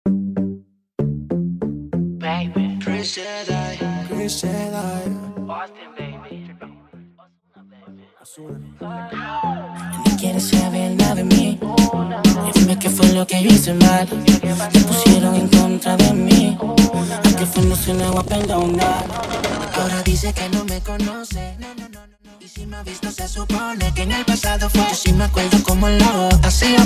Version 96 BPM

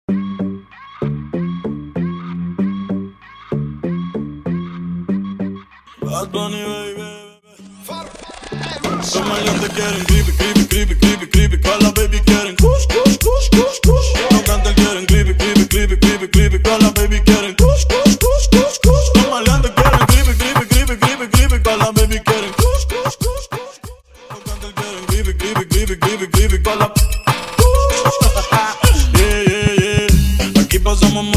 Version 96 BPM

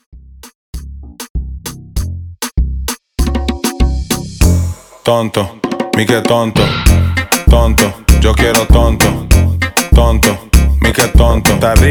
Tonto Intro Verso 98 Bpm

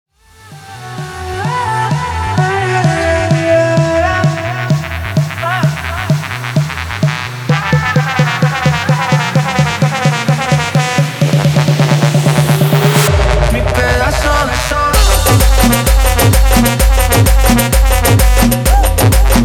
guaracha remix 130 bpm

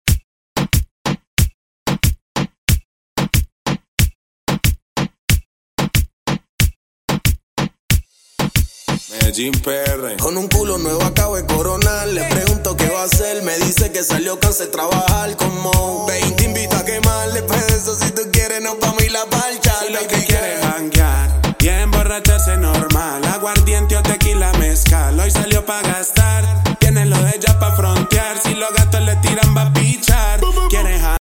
Intro 92 BPM

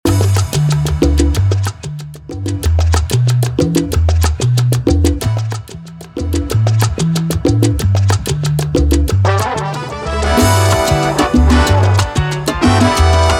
Intro Outro 93BPM

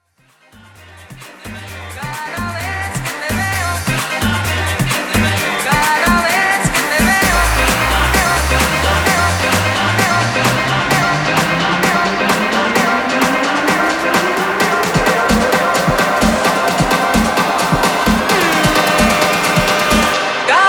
tribal remix 130 bpm

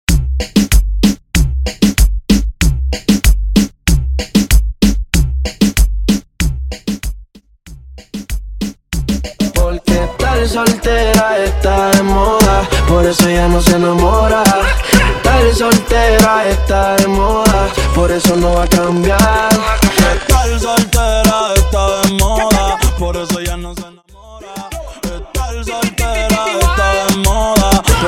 Remix 95 BPM

